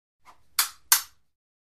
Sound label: Fencing; Slight Sword Swish Followed By Two Hits